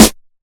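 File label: sharp snare drum a key, tuned to the A note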